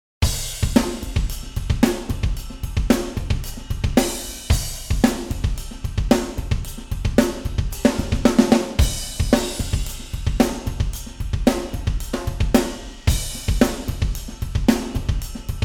room to kick it

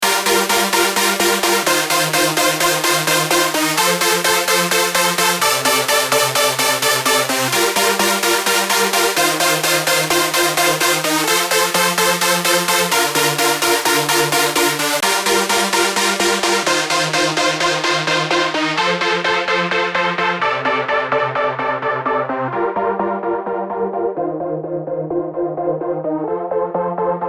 synth warmer chord

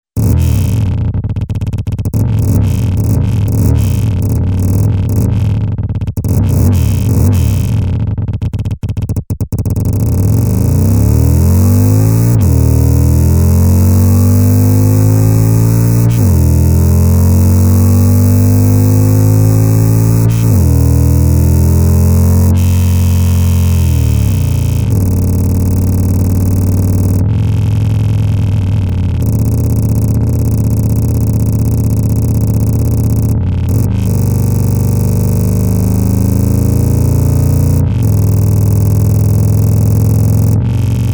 burly motorcycle

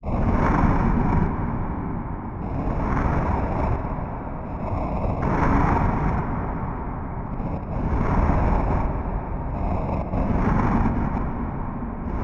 drone

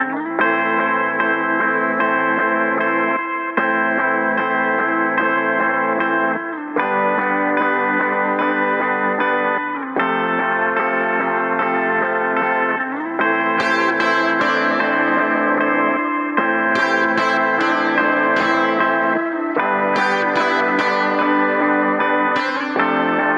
battl beats loop

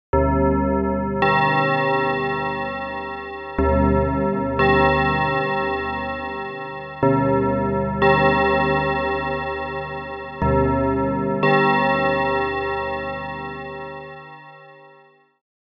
fm midnight bell; opxpro3

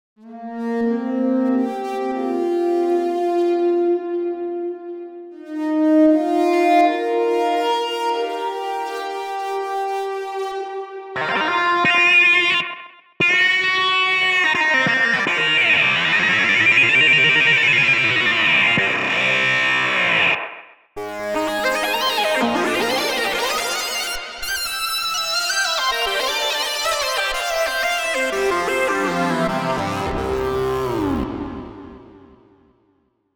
wiggle presets leads

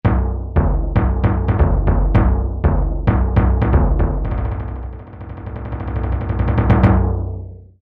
orchestral timpani

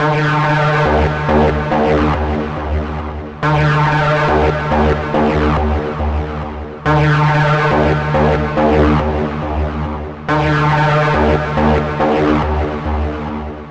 D 140 bpm; battle scar

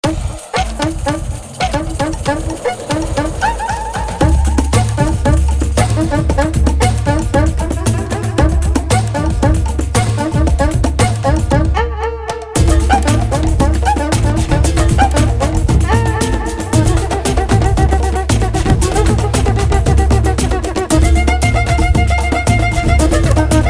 cuca boom

